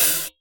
Present Open Hi Hat Sound F# Key, Royality free open hi hat tuned to the F# note